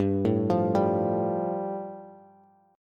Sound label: G 7th Suspended 2nd G, Bass; G7sus2 arpeggiated